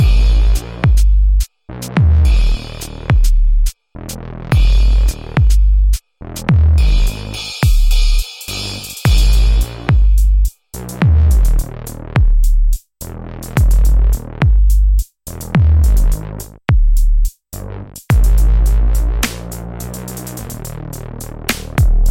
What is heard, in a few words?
DnB Trap; dinopark